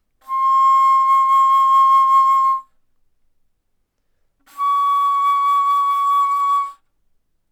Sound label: Bass Flute ff C6 Db6